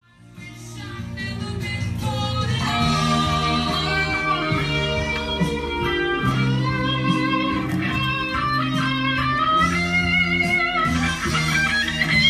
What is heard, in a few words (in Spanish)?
Guitarra